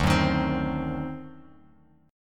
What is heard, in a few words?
D 7th Flat 9th D down Bass Standard